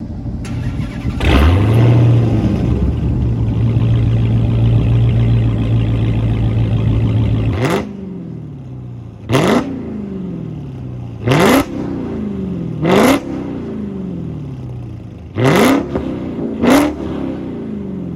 dodge challenger hellcat redeye

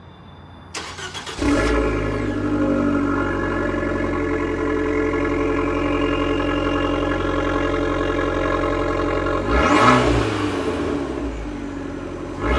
abarth 595

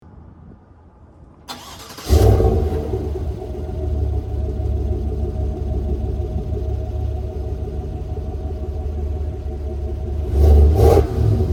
chevrolet tahoe

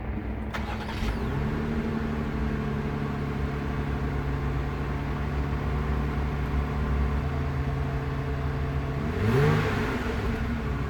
jeep wrangler

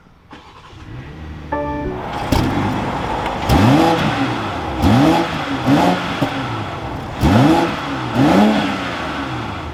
bmw x6 xdrive40i

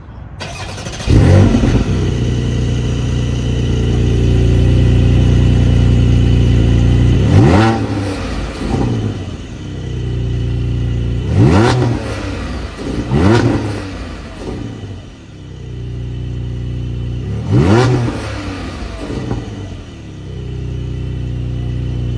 porsche 718 boxster gts

